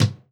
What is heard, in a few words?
Kick, Trap